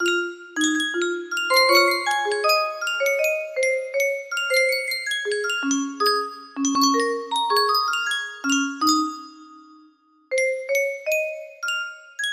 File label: 60 80 bpm, music box melody